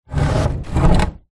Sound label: wood planks thick x2 slide against metal wall; foley